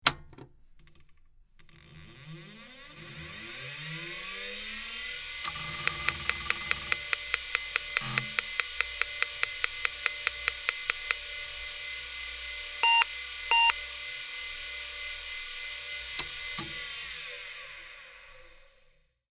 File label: Pc Startup Aborted, hard drive whir